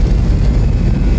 pickup; evilcore

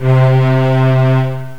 strings

